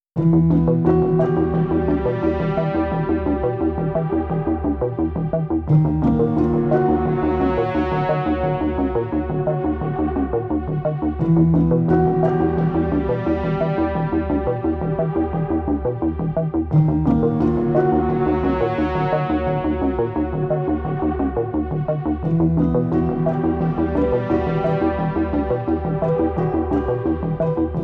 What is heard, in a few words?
enigmatic mysterious; By Burak Sound Bank; epic tema paketleri